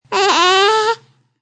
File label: Baby Sound; Babies